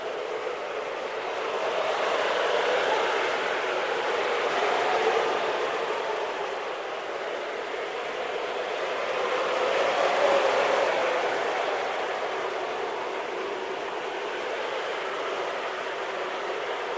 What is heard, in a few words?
wind normal; unity3d